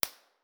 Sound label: koli snow, koli national park winter; 1 way mono